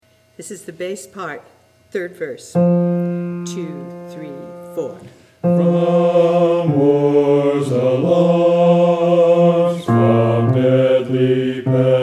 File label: BASS, Choir; god of our fathers